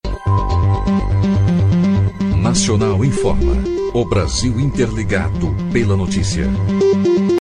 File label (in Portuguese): vinheta